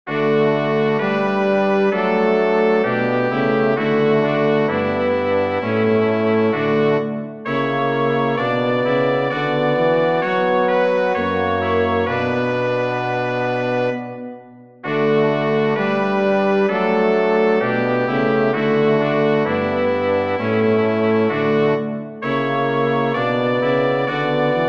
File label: chorale for brass quartet; bach johann sebastian; befiehl deine wege